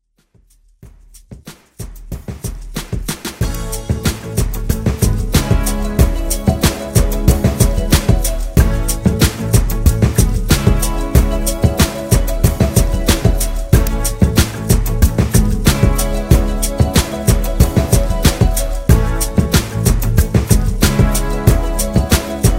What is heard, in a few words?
80s Pop Redrum